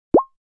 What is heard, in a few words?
cartoon drip; NCH Library